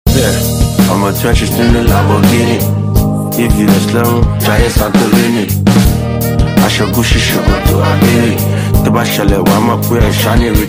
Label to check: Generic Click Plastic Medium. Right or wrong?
Wrong